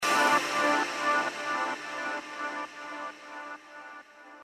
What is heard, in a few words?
Dard SMS, آهنگ زنگ موبایل